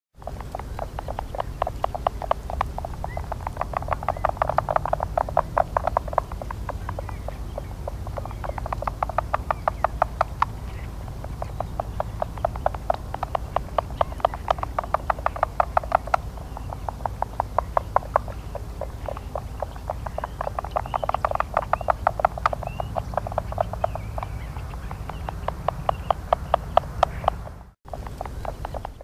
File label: Oregon spotted frog spring song